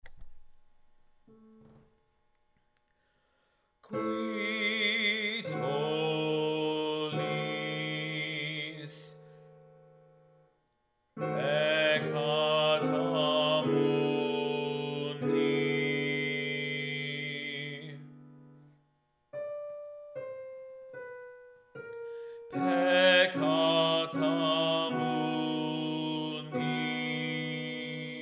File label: Bass; Qui Tollis